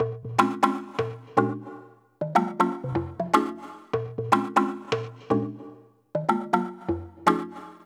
keys hell phone

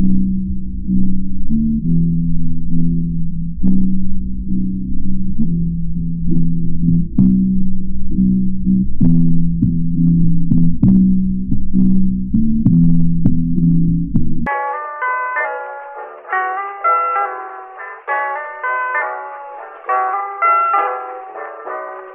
guitar rumbly, Loops, Phonky

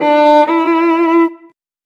strings quickie, Sad Loops

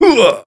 "huh"

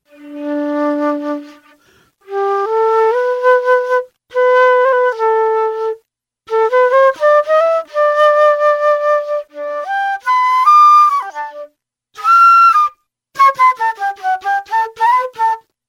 flute, Loops, Phonky

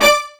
strike, String

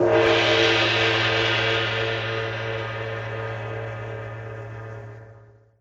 yeppers, Cymbals

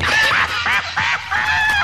laugh cackles; ooh ahh

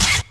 squawk

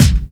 Kicks Loud

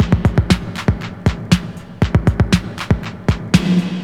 bottle, Drum loops Electro

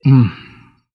"mmm", Voice; ooh ahh

